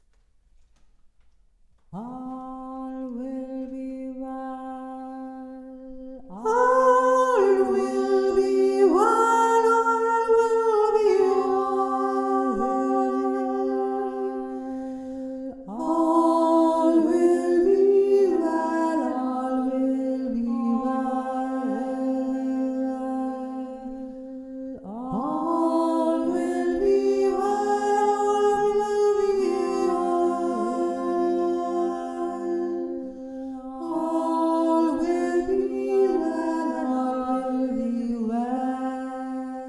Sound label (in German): hohe stimme